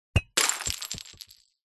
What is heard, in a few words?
impacts glass soccer ball hit bottle knock over smash ground